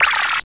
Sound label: arm